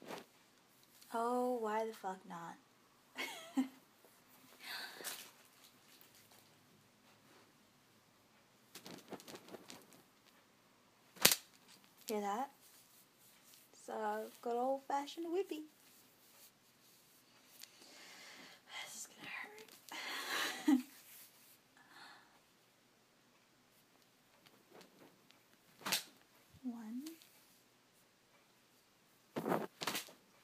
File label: Whip